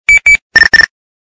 tono de notificacion de nextel walkie talkie; tono de llamada

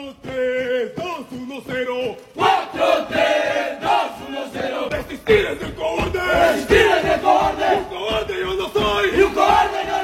alarma militar

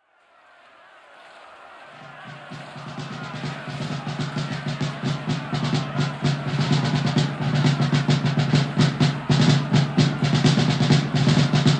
tambores militares

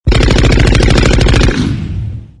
balazos

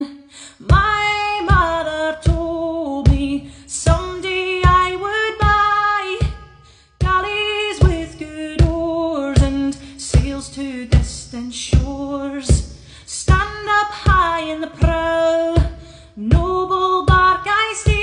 cancion vikinga

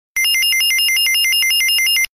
samsung basico

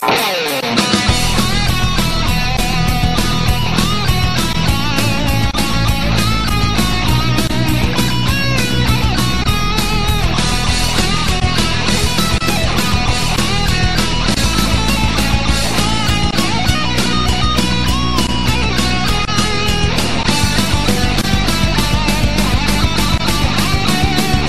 navidad rock

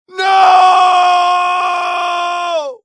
grito noooo

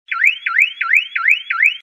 alarma automovil; tono de llamada; Alarma Automovil 2

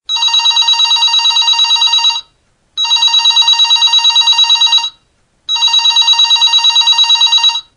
nuevo telefono

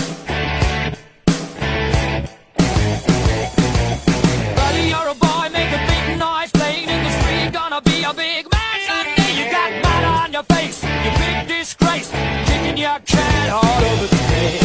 canciones de rock

